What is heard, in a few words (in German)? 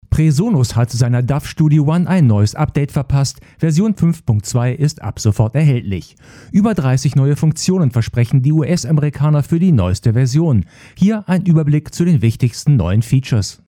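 Zoom PodTrak P8 Bässe max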